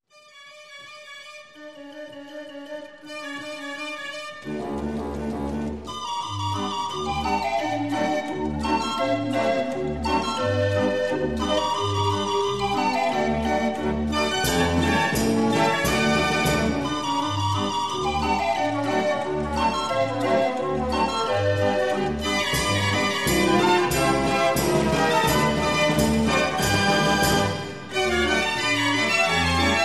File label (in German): Muhle im Schwarzwald, draaiorgel